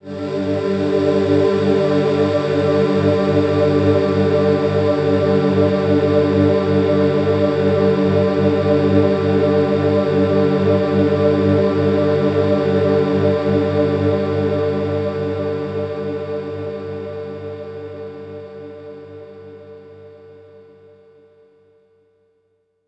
Choral Chord, Ambience; Sonic Foundry (Sony Creative Software); Numina II

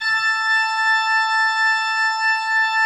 A4, Cathedral Organ; ILIO; Propeller Islands Cathedral Organ

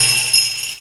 THE BELLS, RAW PERC; Zero-G; Funky Elements

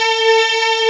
STRINX A3; CMP - Analogon

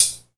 HH, Dance Mega Drums; Best Service; HH-HIP

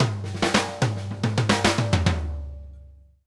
drum fills 108; Sampleheads; New York City Drumworks VOL-1

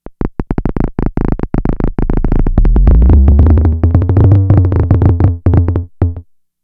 FX, Drum Machines; Fricke Schlagzwerg

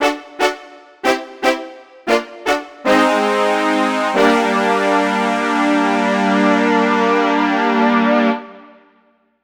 Brass Stab; Dr. Fink's Funk Factory

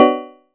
Chord; ModelCycles

